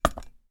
sewing machine toy vintage place down on surface, household